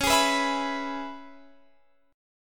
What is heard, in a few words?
Db Minor 7th Db; strummed